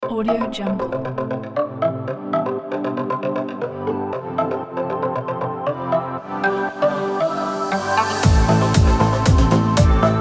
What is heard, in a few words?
oceanic vapor